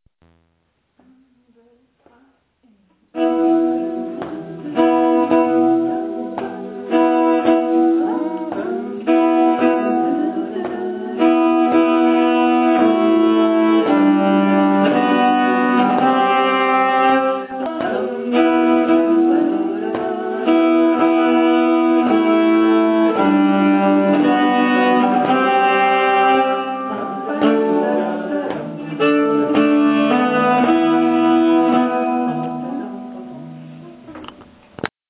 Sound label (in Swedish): Shottis, nyckelharpa; Shottis Villemann